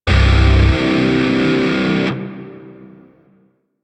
Shawzin Pent Min Chord D; Grineer; DE; Warframe

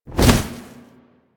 Bird Of Prey Wing Flap Down; Characters; Warframe

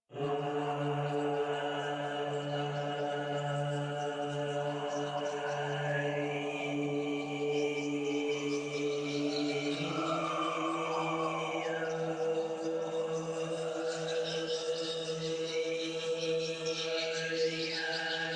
Choir; Warframe; DE; Sentients

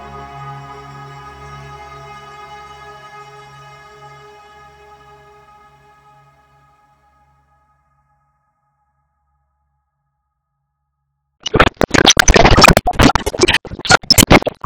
Wake Up Spawn End, Music; Midrath

